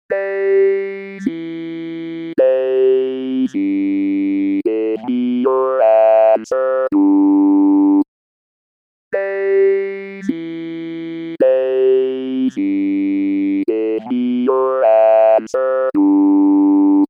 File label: original melody